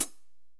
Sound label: Hat, dry kit